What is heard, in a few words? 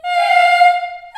F4, CHILD, Choir; CHILD IHS